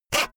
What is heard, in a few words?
saw use short quick